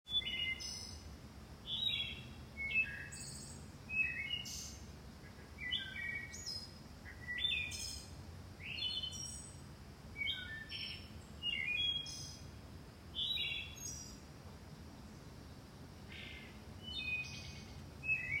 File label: bird back deck; 79 degrees